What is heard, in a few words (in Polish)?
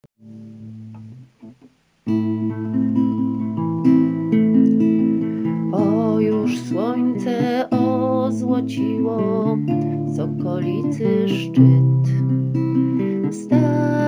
gitara